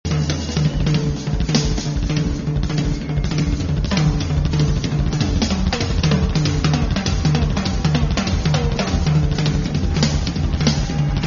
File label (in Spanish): solos en bateria